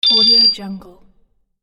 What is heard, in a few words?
collect jewel rapid; sonic-boom